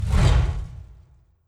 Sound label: Catapult Bones Launch